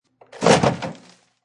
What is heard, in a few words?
Pulling paper towel from a dispenser.; Classroom; Schools